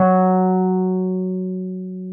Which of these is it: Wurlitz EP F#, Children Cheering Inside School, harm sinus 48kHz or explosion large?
Wurlitz EP F#